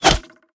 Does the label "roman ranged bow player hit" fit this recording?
Yes